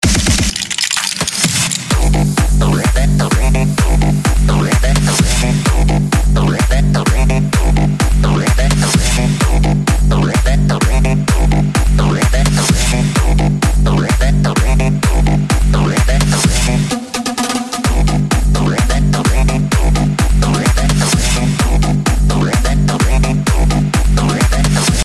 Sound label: edm ringtone; english ringtones